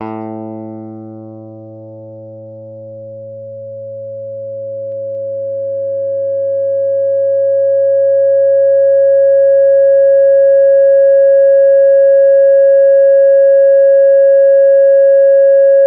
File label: gtr G7